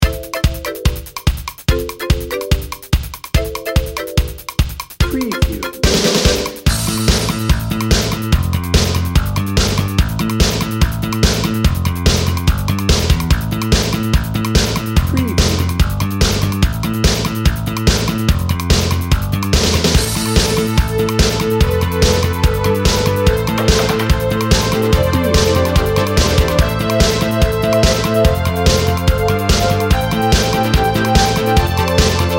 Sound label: cardio crunch